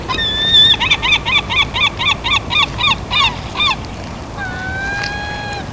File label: herring gull